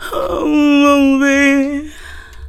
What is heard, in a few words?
FEM VOX, Hip Hop; E-MU Formula 4000 Series Vol. 1; Hip Hop Nation; Phatt Phemmes 1